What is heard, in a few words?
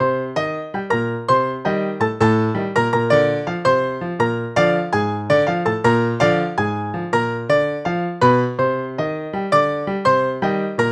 piano